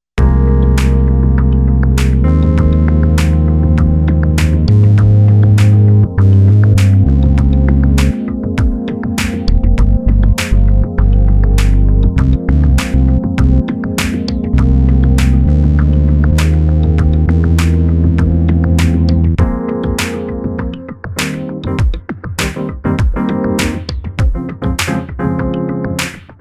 Filter REZ JAM

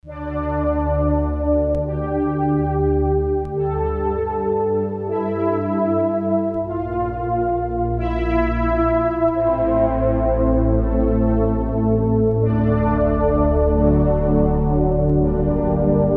Sft Syn Orc Mel; Digital Synthesizer

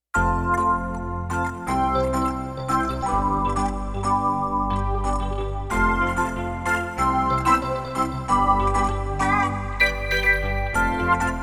Late 80s Stack; Roland VC-1

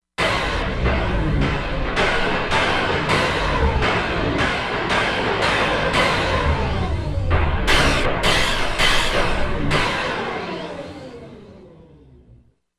SFX, Korg MSC-16S; Environment

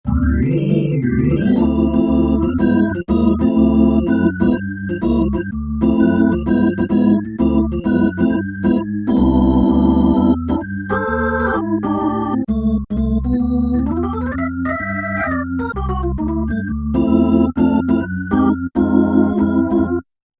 B-3 Brite Fast; Keyboard Instruments; Roland L-CDX-02 Keyboard Instruments

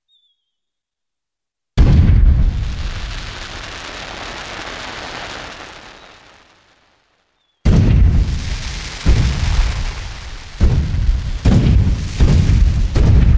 Fireworks; Roland SR-JV80-15 Special FX Collection

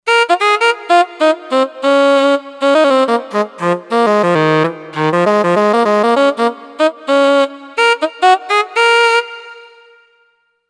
Alto Sax

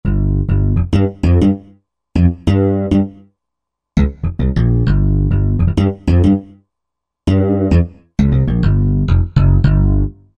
Dyno Slap Bass, Korg Wavestation A-D

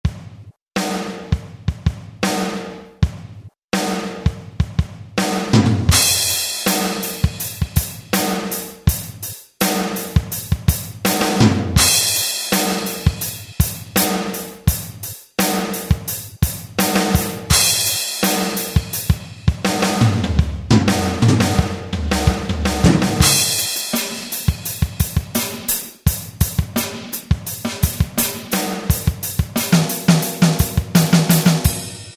Solidify Kit; Sonic Reality Modern Songwriter

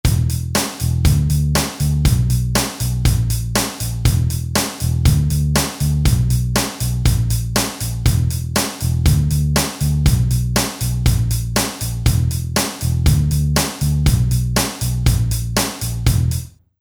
Rock

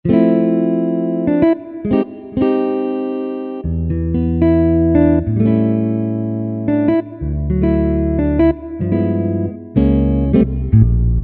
Jazz Guitar, Yamaha PSR-3000; Factory Voices; Guitar & Bass

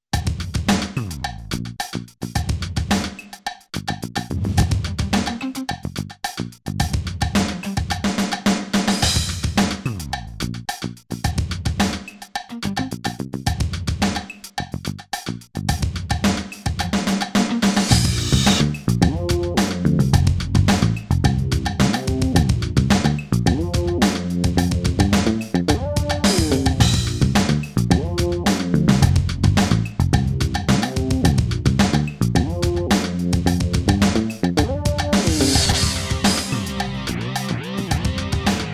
Hit Kit Rock Bass Crunch Gtr

